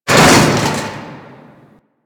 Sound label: Intermediate Coring Metal Bin Stop B; Warframe; Ambience; Grineer; Grn Intermediate Coring